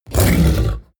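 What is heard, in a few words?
coloss growl deep short, Creatures; kreaturen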